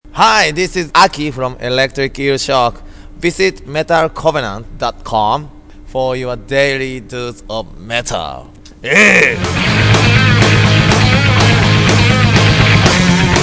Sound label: jingle